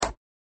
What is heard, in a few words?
mouse; blockgame